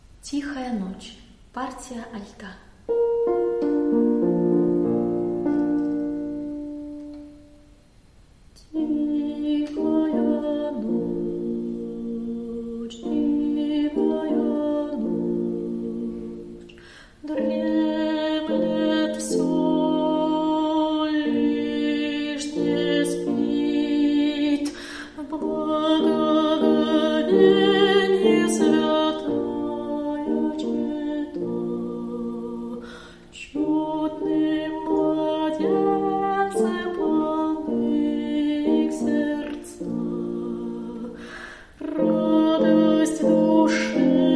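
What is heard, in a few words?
alto; Tihaya noch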